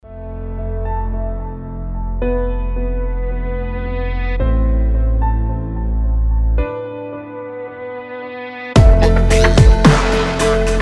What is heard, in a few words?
instrumental